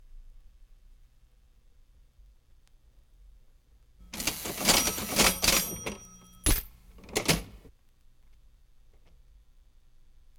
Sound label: Cash Register Old Crank Type; Jac Holzman; Authentic Sound Effects Volume 2